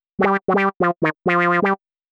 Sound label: 120 synth licks C, Keys, Nu Jazz House; PowerFX